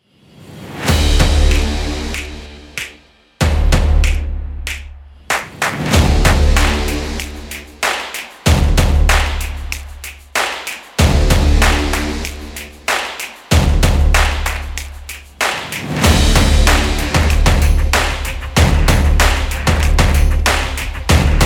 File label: Stomp Rock Trailer, powerful rock anthems